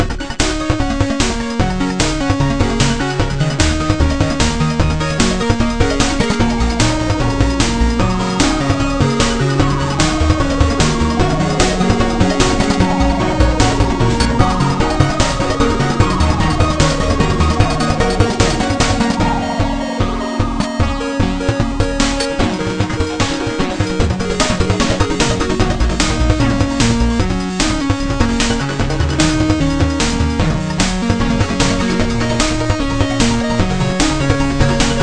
Track 8bit; Scribble Hero

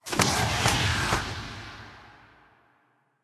Infernal heat Whirlwind Loopstart; Hero Murglun

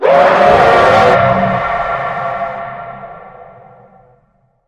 Screamer Die; criminality

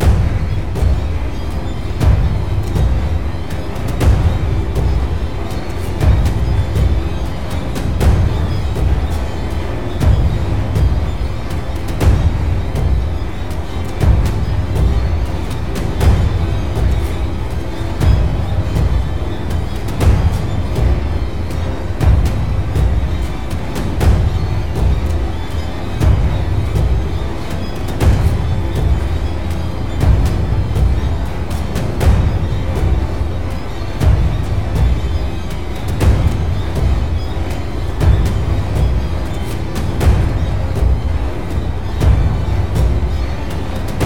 BGM Kowloon Park Boss

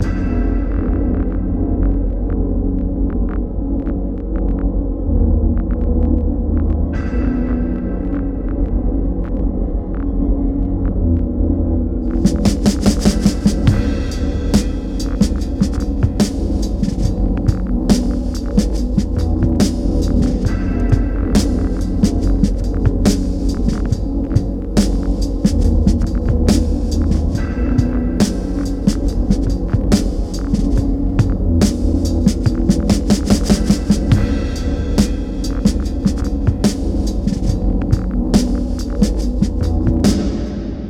METAL; enter the gungeon; 33 WINCHESTERS

